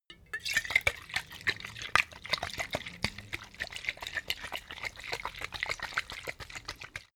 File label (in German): bottle vodka shake, gastronomie